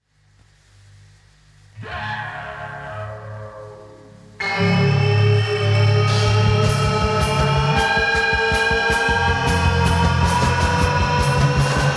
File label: Slot Car Synth